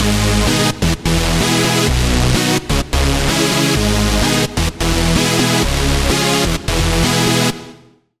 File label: Chord; Routed Sound; Serum 2 Expansion - Star Scavenger Vol.1